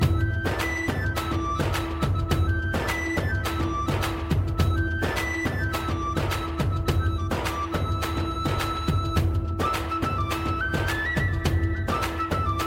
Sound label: Beat